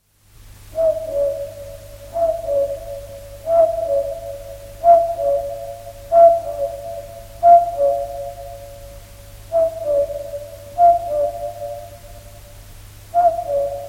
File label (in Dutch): Koekoek, Koekoek is duidelijk te horen in de tuin; Koekoek (vogel)